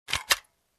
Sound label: Shotgun Reloading